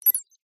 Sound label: Beeps Tiny; lesser vibes